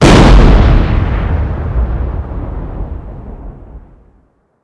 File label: cannon US